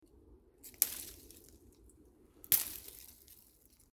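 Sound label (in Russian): branch rebound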